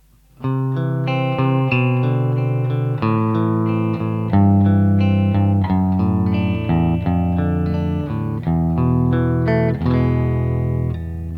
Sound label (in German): Hals & Steg PU clean